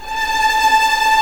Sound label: A5 TREM, VLNS TREMOLO, String; East West; The Ultimate String Collection